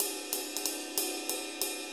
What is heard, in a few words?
6-8 Ride, HiHat & Ride Loops